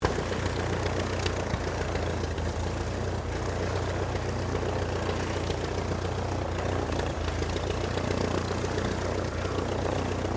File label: AIRCRAFT DH82A DEHAVILAND TIGERMOTH TAXI; 1936 Tigermoth; Airplane Sound Effects; Vehicles